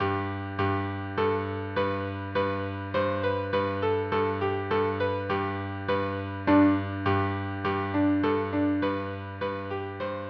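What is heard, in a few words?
pastorella violin cello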